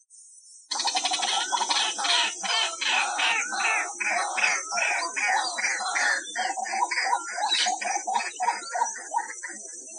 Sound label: White breasted Waterhen Amaurornis phoenicurus; Ladakh